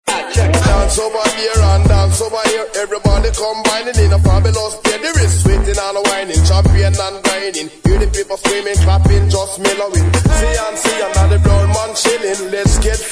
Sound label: hip hop funky